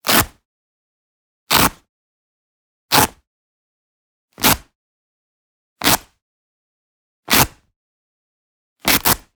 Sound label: Cloth Foley Backpack Velcro Straps Ripping Apart Stress; Systematic-Sound; Sound Themes - Modern Cloth Foley 01